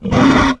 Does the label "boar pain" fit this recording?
Yes